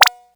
MPC 60

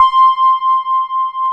SYN, SYN Analog; Sound & Vision; Gigapack I CD 2

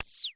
Dual-Percussion-Synthesiser; Klone

